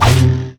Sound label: crit attack; masteryi skin02